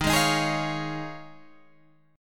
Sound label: D sharp 7th Suspended 2nd Suspended 4th D sharp, Guitar, Standard; strummed; 11,11,13,13,14,13; D#7sus2sus4; JGuitar